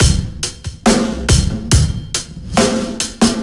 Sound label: SloMo Beats, Loops 140 BPM